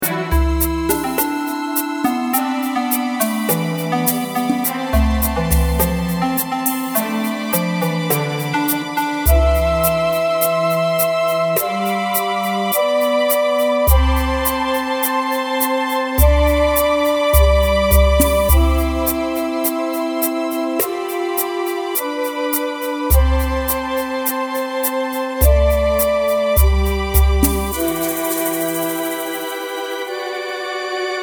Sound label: choco birds forest